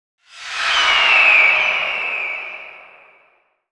Symbol Gryffin; netent

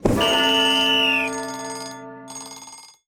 pick n click failure

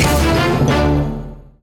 medium win; netent

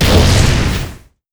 Hit Heavy Volcano; netent

